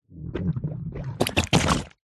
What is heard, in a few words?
cartoon slime gurgle deep bubbles; zapsplat